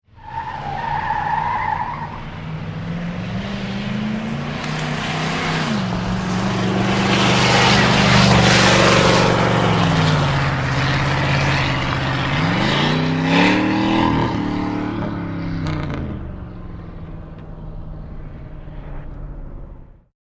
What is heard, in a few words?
Car Big V8 1 - Long shot wheel spin - up and past close - away and stop; slight blow on silencer; Cars; Vehicles; Tom Hutchings